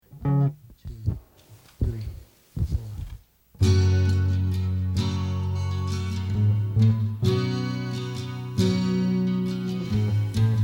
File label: West Philly Instrumental